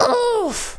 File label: OOFF; 1997 MMX LAN PACK (win 95); Worms Armageddon; Danish-Pyrus